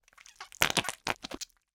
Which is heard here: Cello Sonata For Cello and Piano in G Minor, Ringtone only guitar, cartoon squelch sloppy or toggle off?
cartoon squelch sloppy